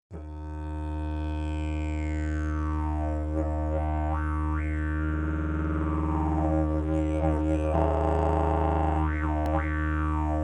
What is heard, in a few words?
didgeridoo; jilm prirodni